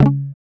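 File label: GUI C#, acoustic guitar; Best Service Gigapack 2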